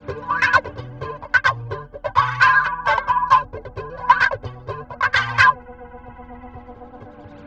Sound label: SHANK, WEIRD WAH, Bizarre Guitar; Spectrasonics